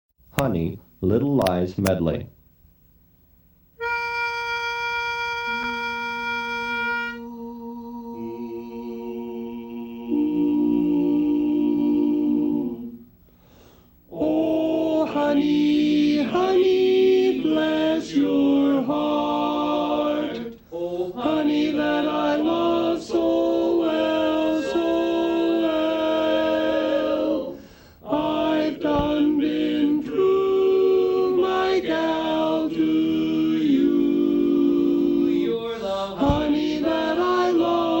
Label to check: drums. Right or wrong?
Wrong